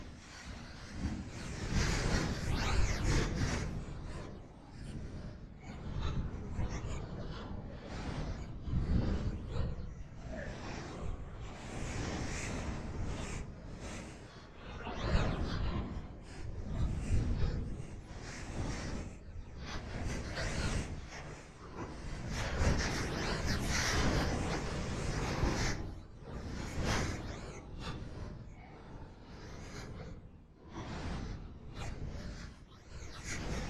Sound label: Futuristic Weapons Sound Effects